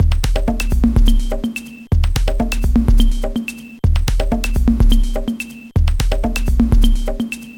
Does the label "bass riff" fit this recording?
Yes